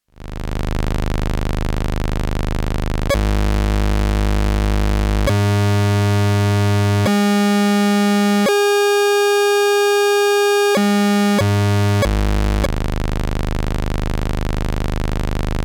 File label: octaves